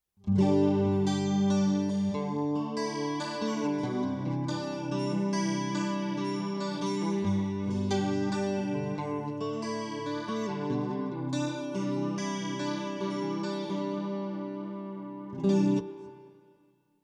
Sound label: DUAL CUBE Acoustic Sim w Chorus Rev